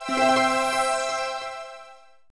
Jingle Battle

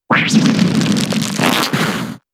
Constrict; Pokemon; gen 7; SUMO; USUM

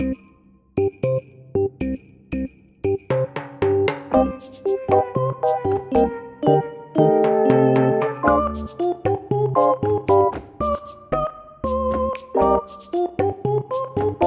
bossanova; hot lava; ios macos windows

